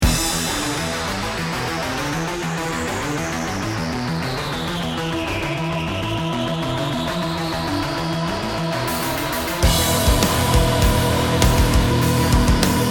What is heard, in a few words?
Rock